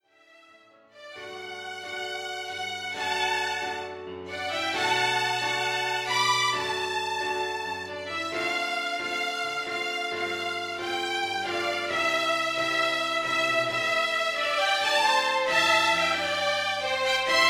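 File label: violin duet and piano accompaniment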